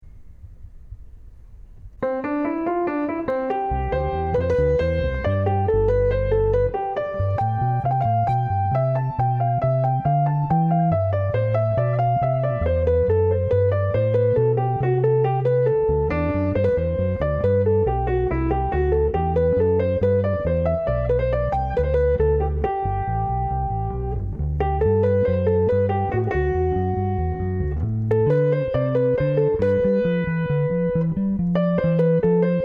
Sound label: Bach Invention, bass